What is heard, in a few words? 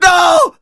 Scared Male